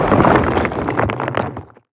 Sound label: rubble